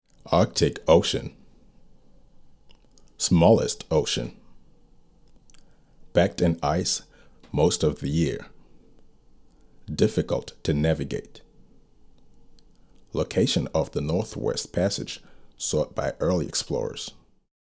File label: arctic ocean